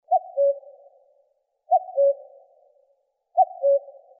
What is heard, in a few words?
Cuculus canorus; BIOAC AVES